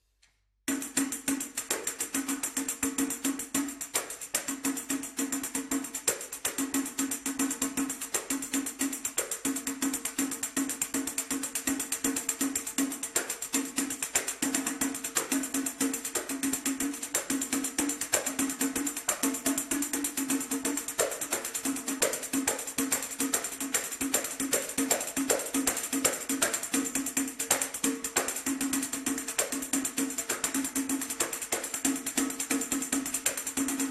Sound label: pandeiro